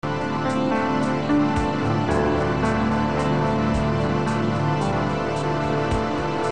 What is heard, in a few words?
LOOP